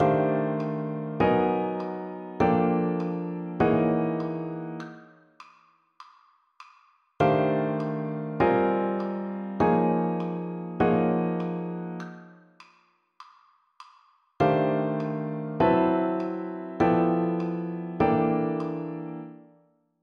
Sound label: blues; improve your blues playing